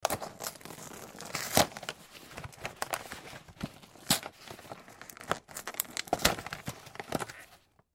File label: Cardboard Box Rip Tear Open Cereal; Foley; Blastwave